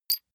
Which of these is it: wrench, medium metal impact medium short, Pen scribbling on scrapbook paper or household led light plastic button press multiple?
medium metal impact medium short